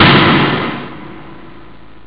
Smack Snare; jazz jackrabbit 2; M8

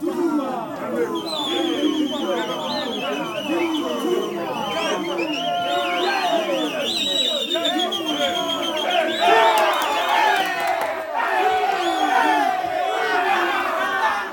CHEER; Spectrasonics; Heart of Africa 1

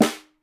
SN, Percussion

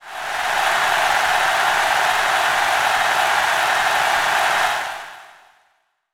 Applause; Roland BK-5

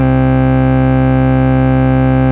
FARFISA ORGAN, Vintage Timetraveler; Sonic Reality

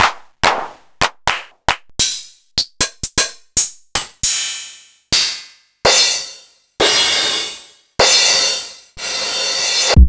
Jomox Airbase990

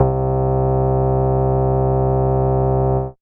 Moog Bass, Dance Synths

